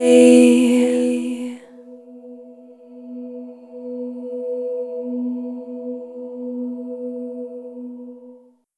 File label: Chord E-B 5th; Sonic Foundry (Sony Creative Software); Pocket Diva World Class Vocal Samples